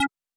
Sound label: bullet; DanmakuProject